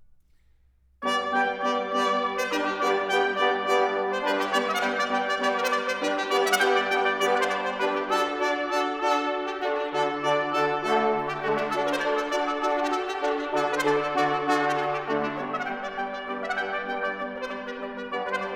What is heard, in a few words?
Pavan, for 5 viols; Dovehouse Pavan; Renaissance, Baroque